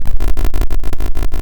Retro Error

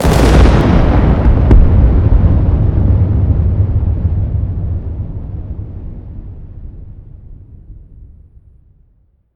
Huge Explosion

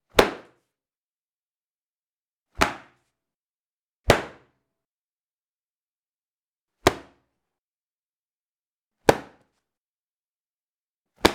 Oven Glove Slaps On Table

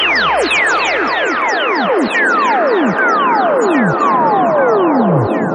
Classic 60s Space Lazer Loop

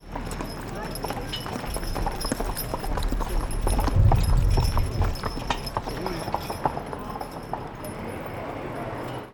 Horse Carriage

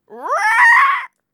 Dino

